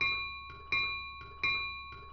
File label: RAILROAD bell